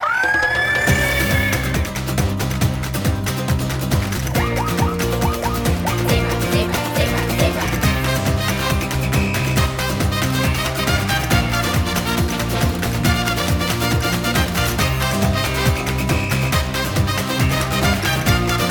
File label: Instrumental Theme; DKB; Zebra Bananza